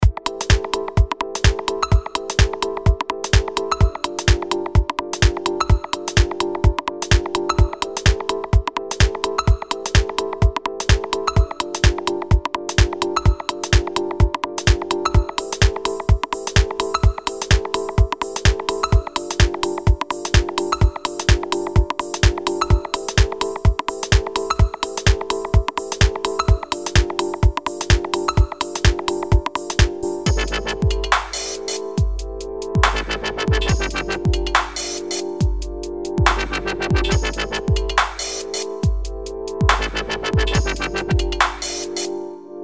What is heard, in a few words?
Drum Machines